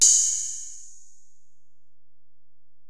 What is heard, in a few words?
Ride, Alesis DM-PRO